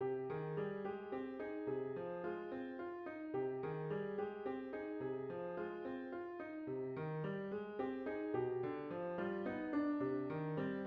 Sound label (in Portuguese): Estudo de Harmonia acorde de sexta